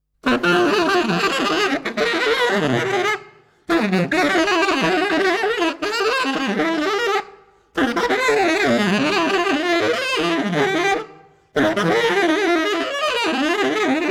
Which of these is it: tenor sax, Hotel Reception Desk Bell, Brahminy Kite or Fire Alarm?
tenor sax